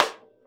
RAW rim, Drums Acoustic; AKAI; 90s sample CD; USB Soundscan vol.10; RAW KIT 4

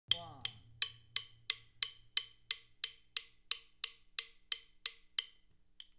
STICK CLICKS, drum

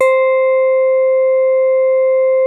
SIDE MID C5, SYNTH PLUCK

